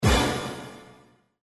Reel Spin Stop Special; slots; Halloween Blast

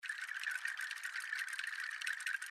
Reel Spin; Oriental Ink

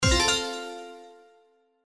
All Win Animation, slots; Majestic India

